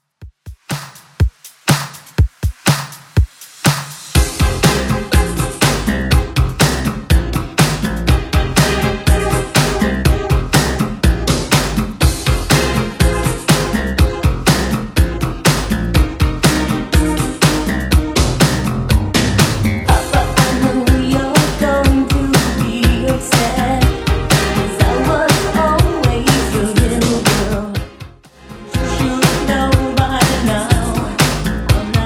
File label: 80s Simple Redrum 122 bpm